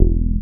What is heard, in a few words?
106 Bass E, One Shot Bass